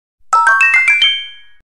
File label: Vivo X Fold2 SMS Ringtone